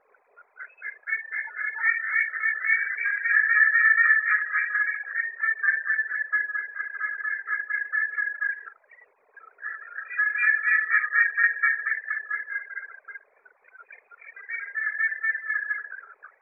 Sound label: Duck Sequence